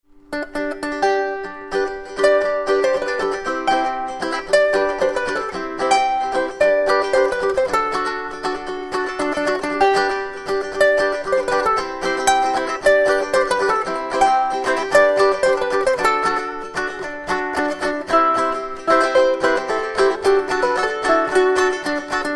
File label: ukulele; Star Wars